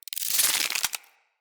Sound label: Root Spider Foley Spin; Animals